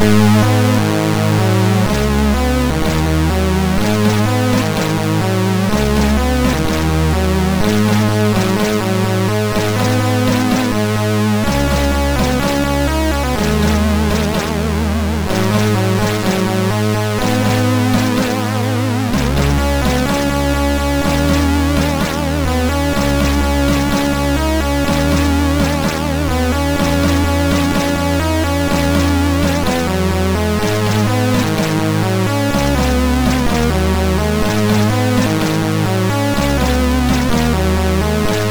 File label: Sound, sid MOS6581R3